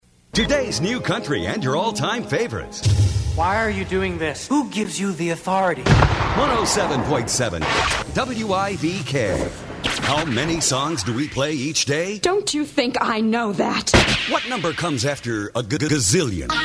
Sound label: Sweeper Montage; WIVK